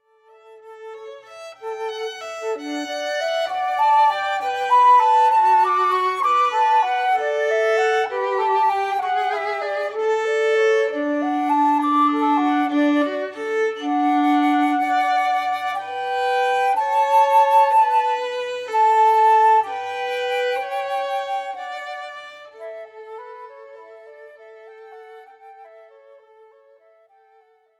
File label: Flute Violin; Wedding Ceremony; Ceremony Musicians